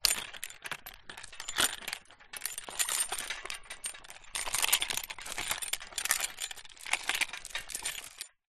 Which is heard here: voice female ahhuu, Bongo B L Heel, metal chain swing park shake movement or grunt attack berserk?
metal chain swing park shake movement